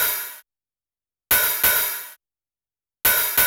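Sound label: open hi-hat, Techno 138 BPM; NEW BEAT ERA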